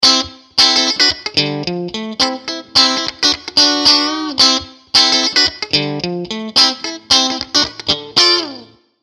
Phantom Stratus

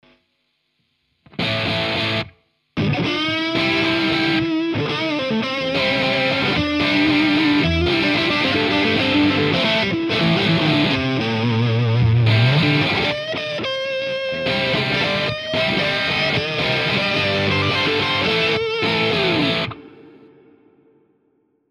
JCM 900 Tele Lead

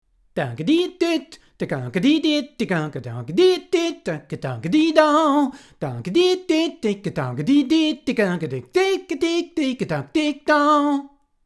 C414B ULS Vocals

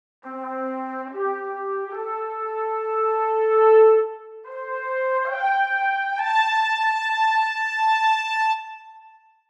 Fanf Trumpets Leg; VSL

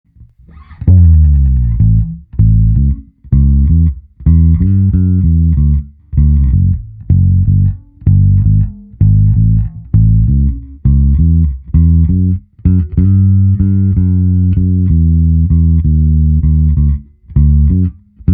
Blues

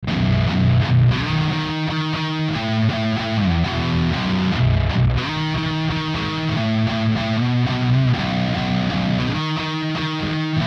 Kemper Profiler Stage; Bogus X-tasy

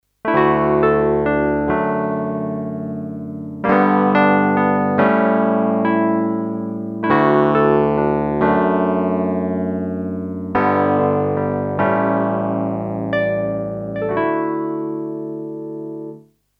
Alesis Prestige Artist Wurly E Piano

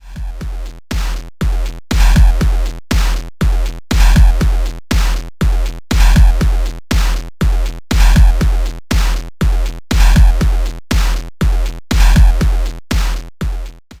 kaossilator2 Loop HardStyle

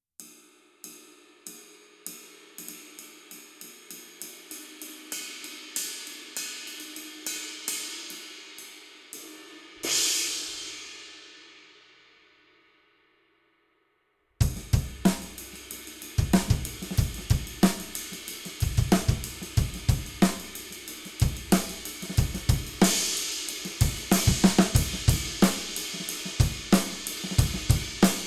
Dual Crash Ride

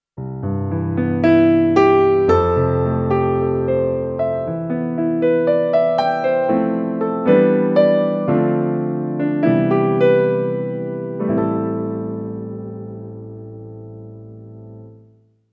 Korg Liano Piano

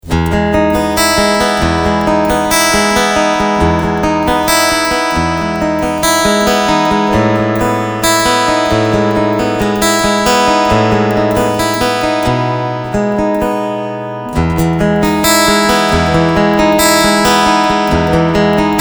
Picking Preamp

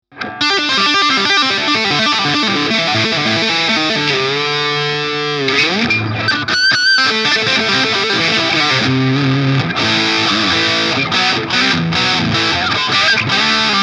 Custom 24 steg; PRS SE; Stealth Quilt LTD; Jhs

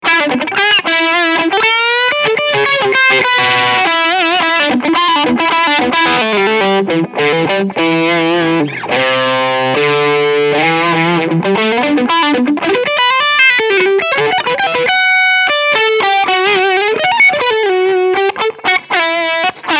Crunchy Lick

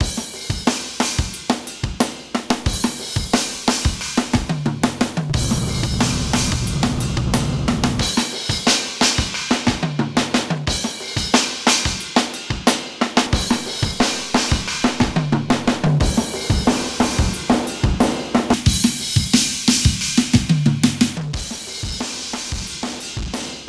DRUMS stepping thru distortion presets

